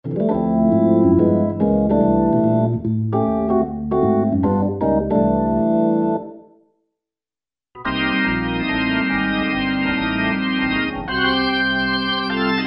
B-3 ORGANS; Best Service Gigapack

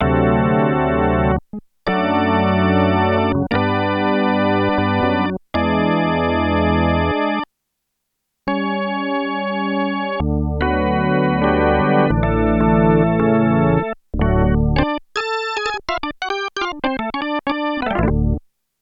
ROTARY EFFECT II, Korg DW-8000; Factory Patches

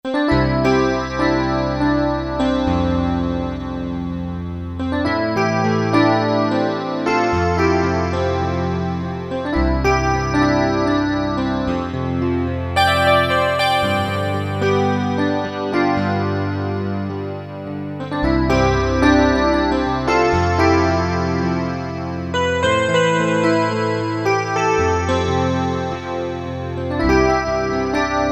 MIDI Combos

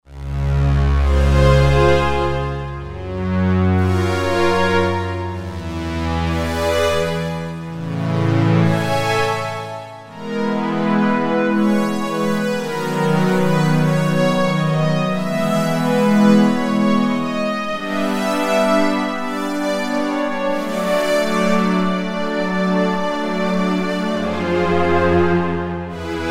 PWM Strings; Roland JV-2080

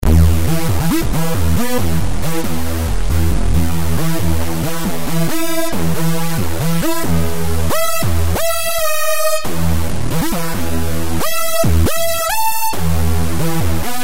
Big Fat Bass; Combinator; Propellerhead Reason 3.0

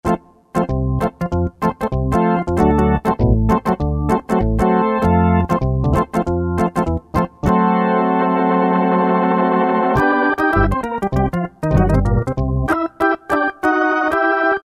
Secret Organ, Roland JD-990; Factory Patches

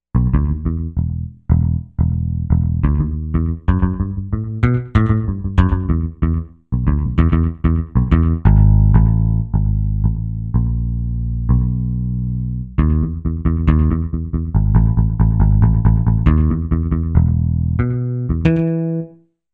Pick Bass

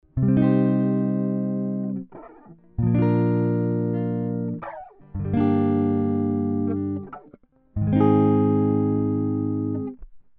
Electro-Harmonix Stereo PolyPhase

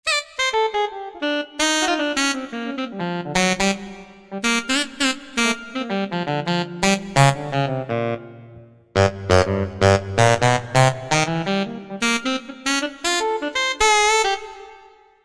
Alto Sax, Korg TR-Rack

